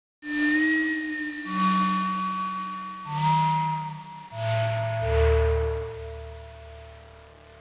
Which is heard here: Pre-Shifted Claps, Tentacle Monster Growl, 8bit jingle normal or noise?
noise